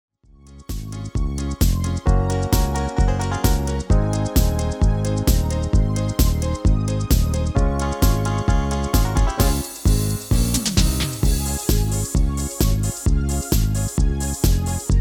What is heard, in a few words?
MIDI